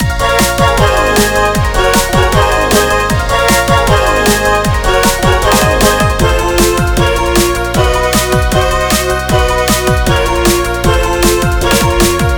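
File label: background music bonustime night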